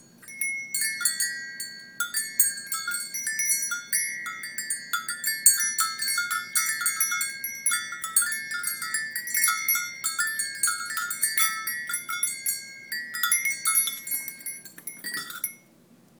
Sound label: Metal Bells